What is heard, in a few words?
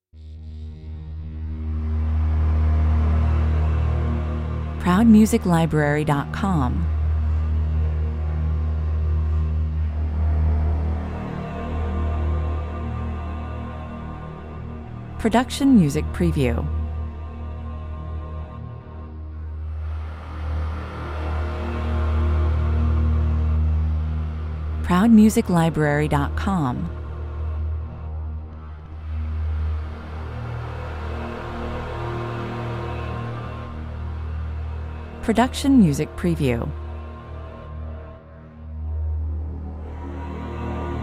creepy bass 40 bpm